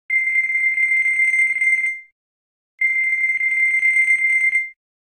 Long Beep, alarms; LG P940 Prada 3.0

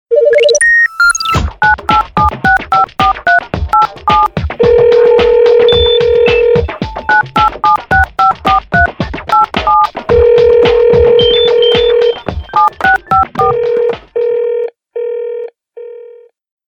Rhythm Call; Coolpad

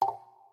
Unlock, ui; Meitu T8

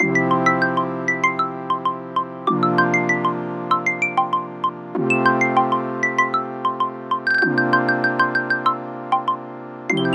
alarm; sound of oo website ringtones

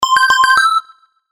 Alert; Senseit; E500

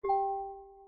Charging Started, ui; Infinix Note Edge

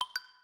Usb Effect, ui; Infinix A88

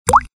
Key Press; ZTE T21 Koryolink; Keyboard

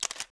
camera click; LG SV730

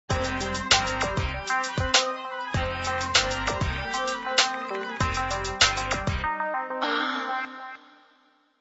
digital signal; Motorola ROKR Z6 Prototype; ringtones

